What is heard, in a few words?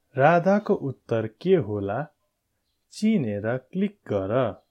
flute; grade1; nepali; kurakani; playtime sounds